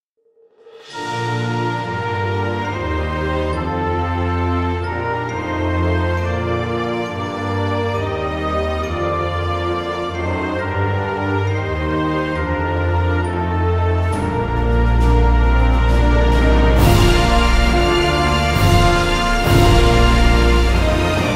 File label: Uk anthem